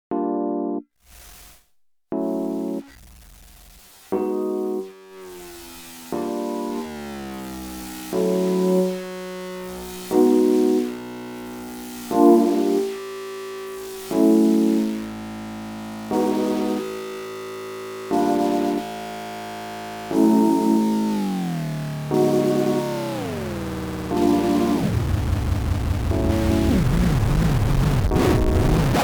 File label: Zendelay; Ninja Tune